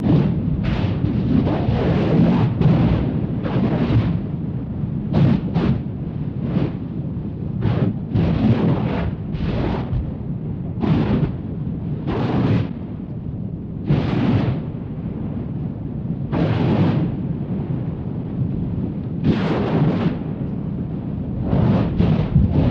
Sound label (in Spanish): explosion de volcan